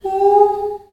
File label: DOVECALL, Heart of Africa, BIRDMEN; Spectrasonics